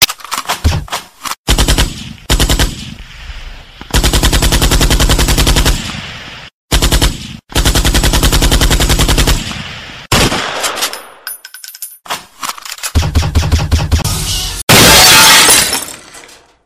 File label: Beat